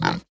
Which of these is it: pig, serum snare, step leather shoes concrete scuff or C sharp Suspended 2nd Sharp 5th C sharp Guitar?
pig